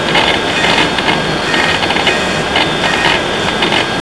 buzzer comp

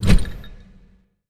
steampipe swing; weapons; deluge

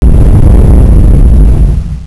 quake

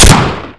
fire; ohama beach

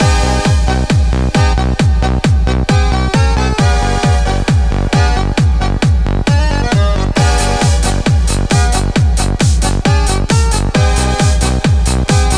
tangology; csde rooms cz; gigis

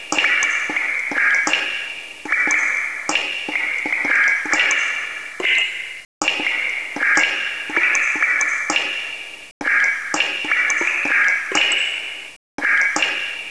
dripping; hydrophobia

